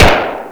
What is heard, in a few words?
colt 45; WAR